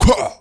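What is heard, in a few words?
mob boss attack; Monster; Bossmob; 쌍면마개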